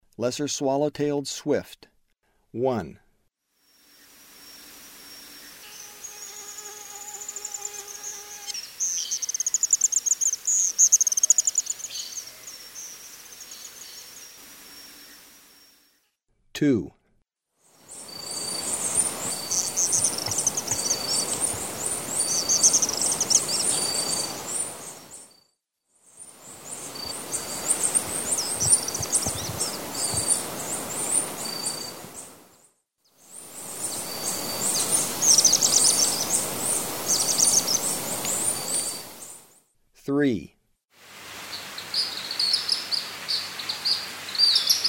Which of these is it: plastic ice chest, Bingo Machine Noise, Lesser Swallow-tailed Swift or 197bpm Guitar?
Lesser Swallow-tailed Swift